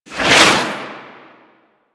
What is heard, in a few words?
Tank Missile Barrage Whizzby; Warframe; Lasrian; Abilities